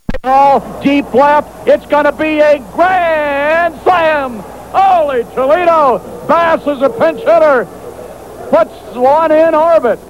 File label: 88 bass; Kevin Bass hits a grand slam in Cincinnati.; Hamilton; Astros